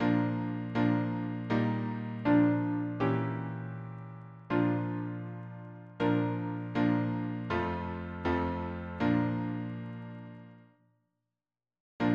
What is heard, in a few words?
harmony piano